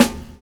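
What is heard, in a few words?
BOND bass drum; Univers Sons - Kitch 70s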